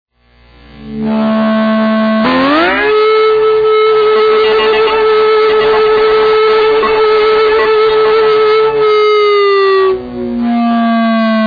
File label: Acoustic Guitar (humbucker bottleneck); D.F. Duck